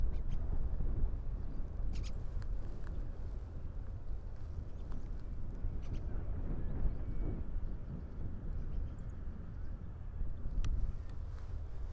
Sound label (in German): ringdrossel